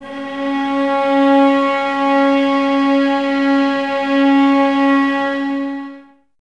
STR VAS C#, Violas, No Vib; Miroslav Vitous

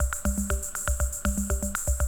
120, Classic Drum Loops

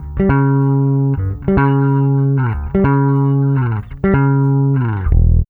BASS, Funky Ass Loops; East West

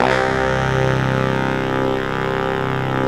ANALOG COMBO; OMI - Universe of Sounds

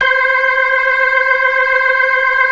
ORGAN, 4 DRAWBARS; Keith Emerson Signature Series

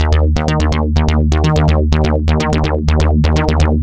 Tech Bass; Sonic Foundry (Sony Creative Software); Underground UK House & Electro

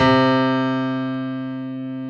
PNO, Piano; AKAI S6000 CD-ROM - Volume 1; CS PIANO

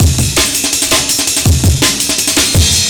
165 Break, breaks; M8

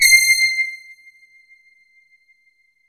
JV POLYBRASS, JV-1080; Syntec; Wall of Sounds

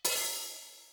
hi-hat, Millenium MPS-400

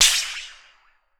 SHEET METAL, Definitive Percussion

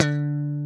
GTR Funky Strat, Funk & Mute

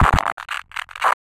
Crunchy, Beats; Sonic Foundry (Sony Creative Software)

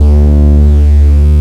BASS, Drum & Bass, 160 BPM

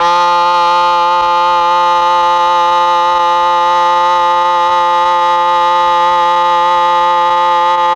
MEL OBOE

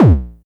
909-ish Kicks A#1, Tuned Kicks; LIMAT Div Dept; Drums

